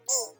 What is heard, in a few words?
Snowman hit; Phasmophobia